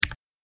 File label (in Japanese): タイピング メカニカル 単